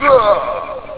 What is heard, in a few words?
death; openrsc